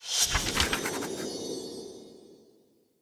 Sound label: UI Note Open